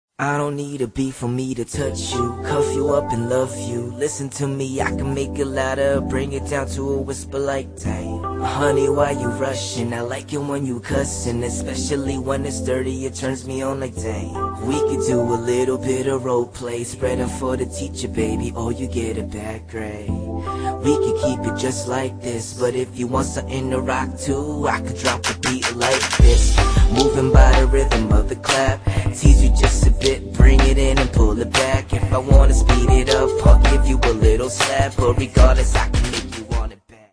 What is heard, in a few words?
Acapella